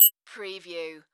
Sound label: Multimedia Beep